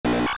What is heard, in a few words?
Kill, Enemy killed; AOL